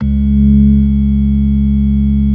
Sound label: ORG.3 CIS2; B3 FULL